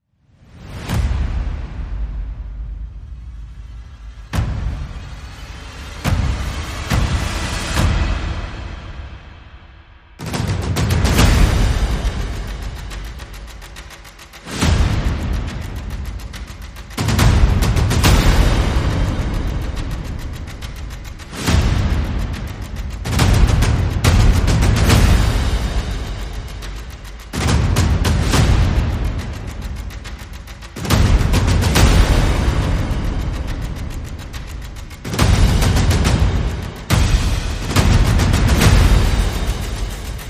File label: Percussion and Effects